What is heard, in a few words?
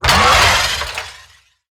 Necramech Melee Attack; Battle Barks